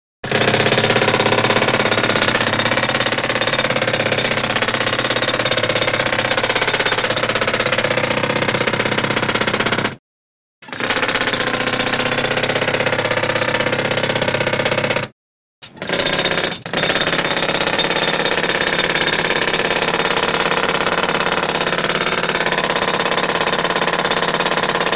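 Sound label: Pneumatic Drill At Work; drills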